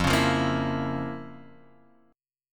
F Major 7th Suspended 4th Sharp 5th F

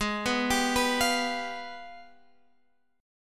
G sharp Minor Major 13th, Guitar Open G; 18,16,17,16,18,x

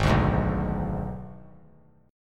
G sharp Diminished G sharp; G#dim strummed; JGuitar

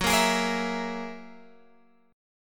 Gb 9th Gb down Guitar Open G

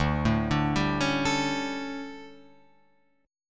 D Major 7th Suspended 4th, Guitar, Open F; DM7sus4 arpeggiated

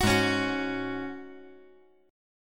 G sharp 7th Suspended 4th G sharp

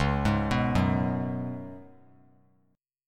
Db 7th Suspended 4th Db down; JGuitar

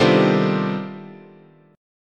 C Minor Major 13th, chord, guitar

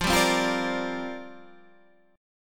C 9th Suspended 4th F

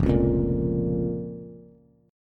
F Suspended 4th Sharp 5th F, Bass

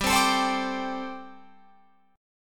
G Suspended 2nd Suspended 4th G, Guitar, Open F; strummed

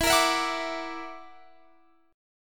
F sharp Augmented 7th E, chord

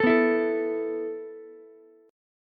A Suspended 2nd A down Ukulele; Asus2 strummed

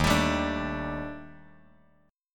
D sharp Major Sharp 11th, Bass Standard; D#M#11 strummed; JGuitar

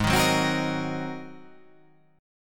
G sharp 9th G sharp

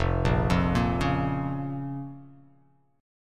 F Suspended 4th Sharp 5th F; arpeggiated; 1,1,3,3,1,x,x,x; JGuitar

